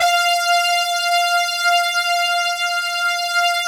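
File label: synth brass f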